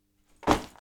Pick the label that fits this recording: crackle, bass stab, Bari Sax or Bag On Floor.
Bag On Floor